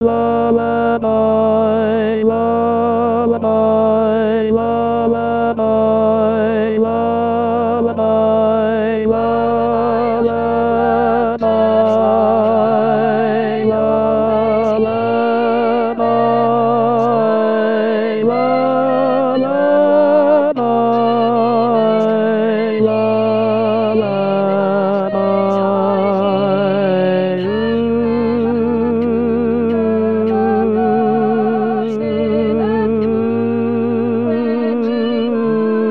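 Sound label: Tenor 2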